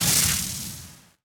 Electricity

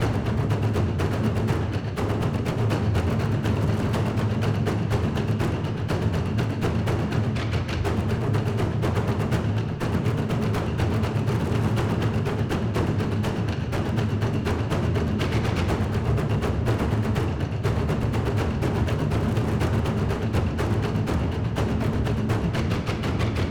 Colosseum Intensity

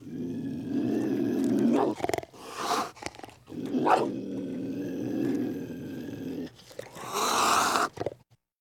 Cougar Hiss Growls; enemies

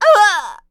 Enemy Generic Human Death Crushed

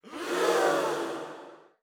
Crowd Gasp

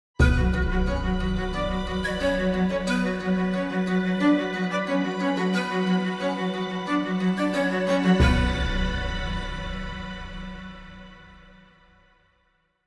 Sting Jungle Night

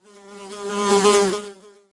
Music Frontend

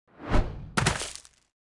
Goblin Jump

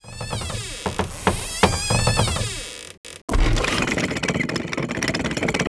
Ladder car Defence Walk; Knight Online

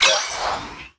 ui academy confirm research menu

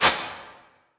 Needle Thow Spin; Hornet

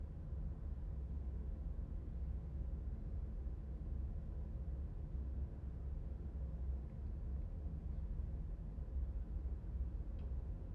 Ambience

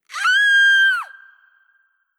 Escape; Heroin Escape E

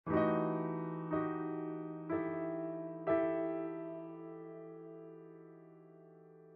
Piano; Factory Boss Piano09

